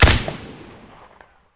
ak103 silencer stereo, weapons; STALKER; IWP

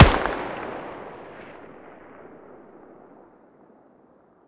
mg muzzle mono far, weapons stationar

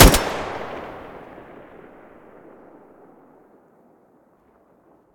groza muzzle stereo, weapons

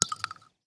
shell 12ga dirt; STALKER; IWP

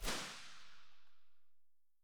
sa58 silencer very far, weapons; STALKER; IWP

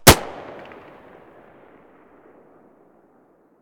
glock17 muzzle mono distant

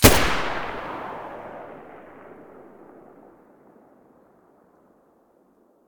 muzzle g36 mono, weapons

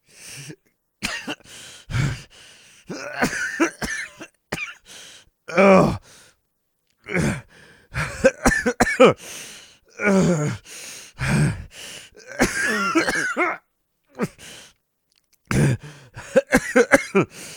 low health; STALKER; IWP

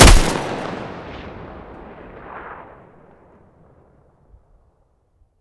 mg muzzle mono, weapons stationar

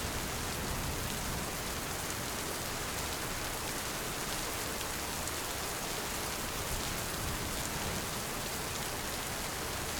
rain; STALKER; IWP

